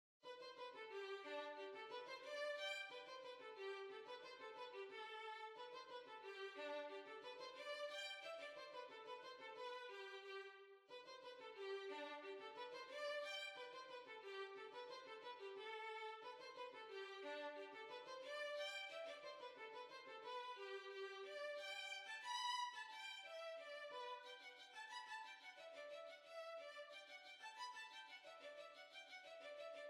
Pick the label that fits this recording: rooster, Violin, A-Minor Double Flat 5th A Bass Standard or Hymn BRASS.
Violin